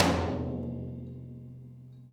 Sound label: Tom Shard; ZeroGravity Drum Shards